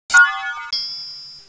beep; Babylon 5 - Entertainment Utility

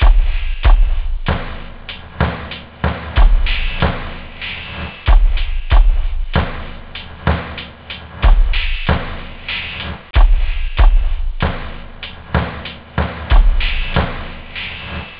dance, instruments wave loop house

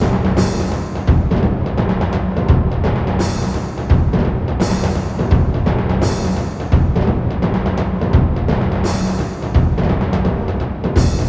music drum loop; MECHCMDR

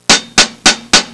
hi hat series in d; Sound Effects for Multimedia - Volume 1

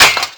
metal hit

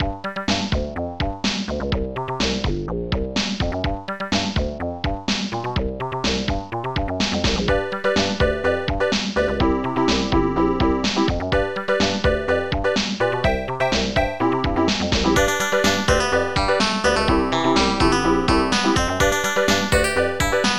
really funky; music

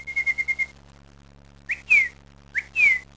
cardinal; DAoC; Toa

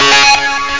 synth; Amiga 8-bit Sampled Voice

